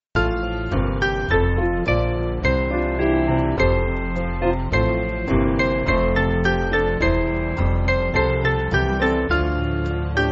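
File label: Piano, Music Backing Files for Churches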